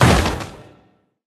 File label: Hero Spawn Impact; Beresad